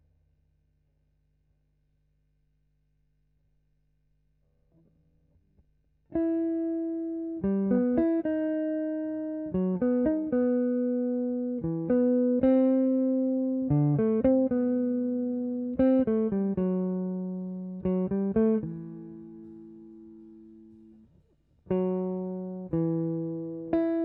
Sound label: GTR B